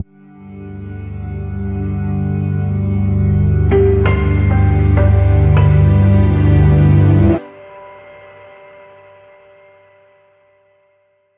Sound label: ambient logo